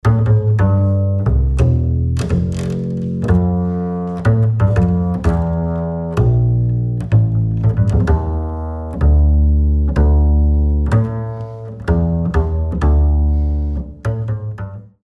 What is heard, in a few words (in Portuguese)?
CONTRABAIXO ACÚSTICO